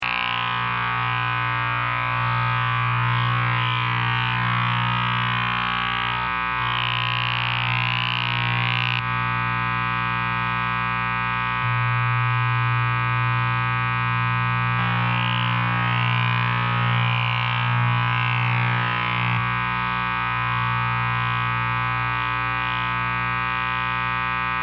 Amp Static Fluctuating, Electric buzz, humm, shifting; static electricity noise from a guitar amp; Noise Sound Effects